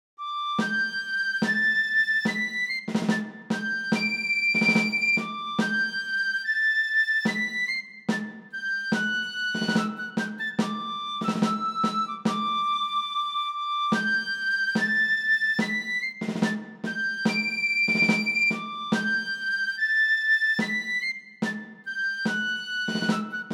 marche mousquetaires roy

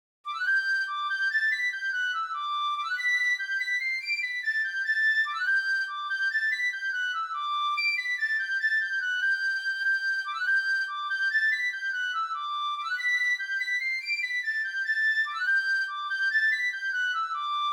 marche royal deux ponts

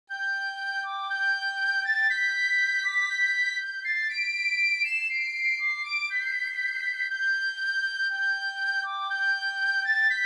premiere marche francoise

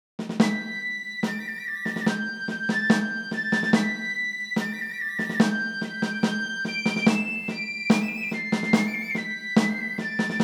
marche marechal saxe

